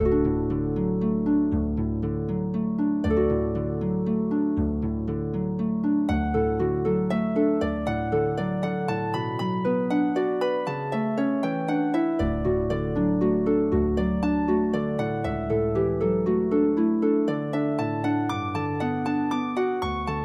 harp

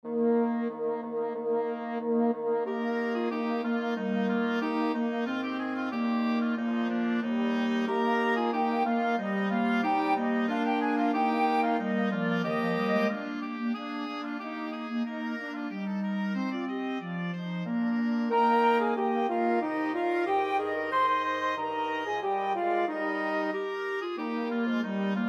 deck the halls

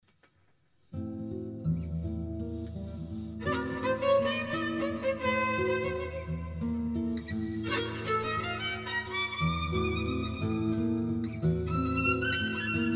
free jazz; plainte des oiseaux

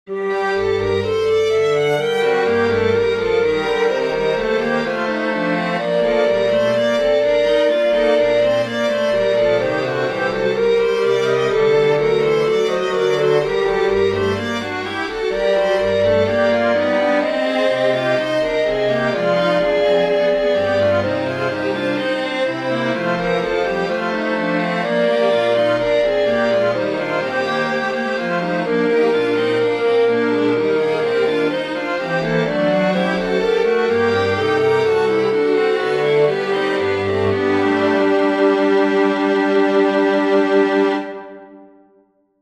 prelude for pan flute strings; bach johann sebastian; ach wie nichtig ach wie fla chtig

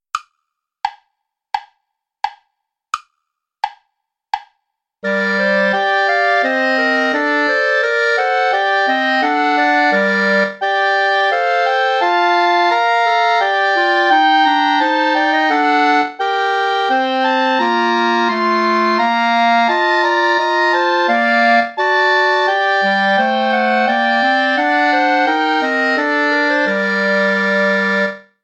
play along without clarinet; bach johann sebastian; beweis dein macht herr jesu christ

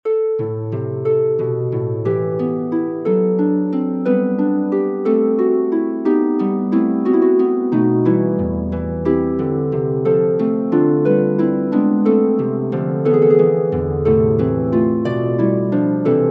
prelude for harp; bach johann sebastian; jesus christus unser heiland

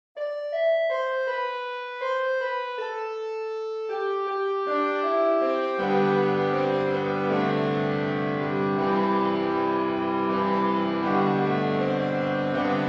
piano and or guitar

